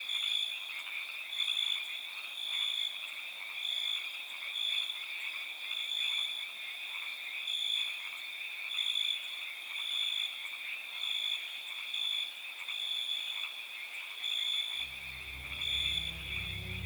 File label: ambiance the grassland night